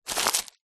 office document folder wallet plastic grab pick up